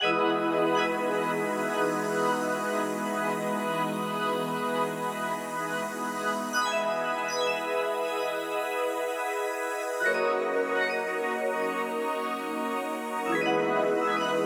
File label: bell pad A, Smooth RnB, G#min